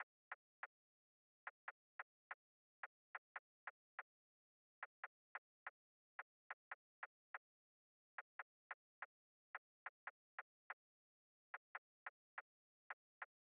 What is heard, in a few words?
143 BPM C# Min Percussion, Drill; Cymatics; Nothing Left